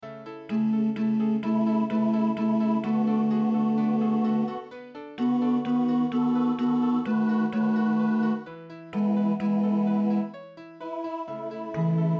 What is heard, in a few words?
tenor